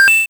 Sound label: Pickup Coin; JotPK